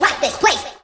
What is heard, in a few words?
Vocal Phrases; Vengeance Samples; Vocals